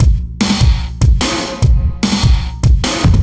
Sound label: Drum, Breakbeat